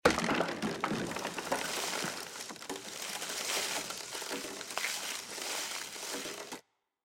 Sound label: SFX tree Large